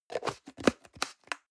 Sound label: plastic can small lid screw close hard